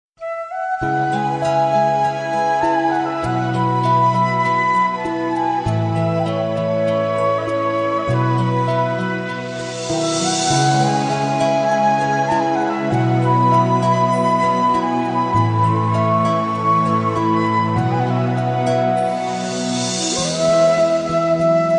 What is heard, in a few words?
Okarina